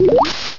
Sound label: Water Sport; battle anims; PokeRogue